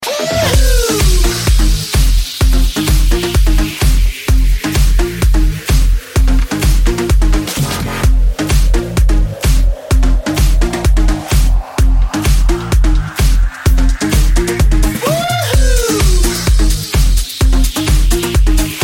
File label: telephone song ringtone